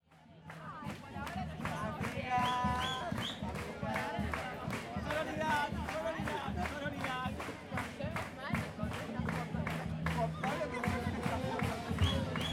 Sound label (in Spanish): carnaval casa uruguaya murga; Paisajes Sonoros de Rosario